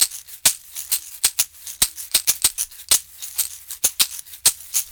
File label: Berimbau Shaker; World Percussion